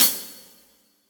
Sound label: Hi-Hat Close